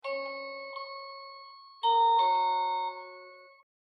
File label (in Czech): melodie